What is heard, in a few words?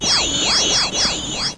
Laser